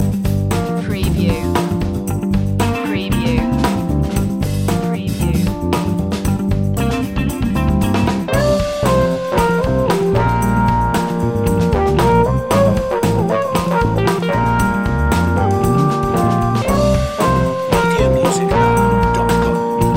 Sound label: cocktail funk loop